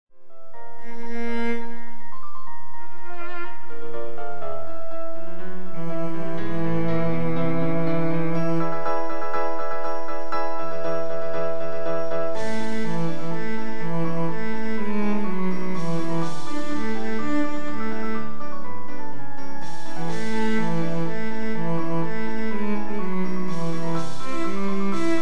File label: bass; carmen